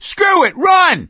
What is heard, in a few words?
yell run, gambler, survivor voice; source engine; left 4 dead 2